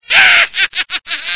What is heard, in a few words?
huhaha; Source Engine